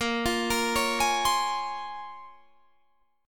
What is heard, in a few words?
Bb Minor 9th Bb, Guitar, Standard; Bbm9; arpeggiated; 18,20,20,18,21,20; JGuitar